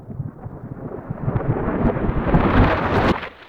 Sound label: Ambience Thunder Reversed; Cinematics; Warsongs; Warsong Prologue